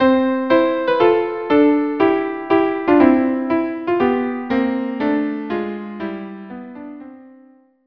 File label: viola duo